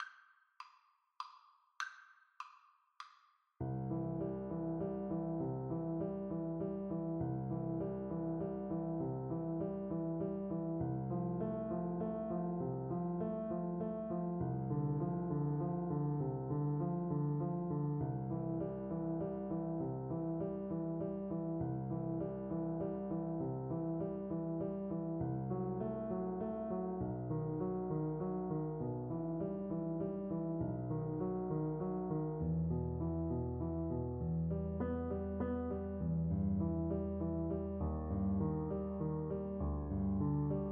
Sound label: Piano Duet